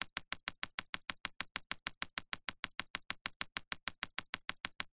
nature water drips constant steady very fast on laminated sheet